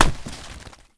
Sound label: fall